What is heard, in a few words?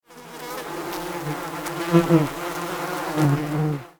bees; Counter Strike Model Pack; CS:GO; inferno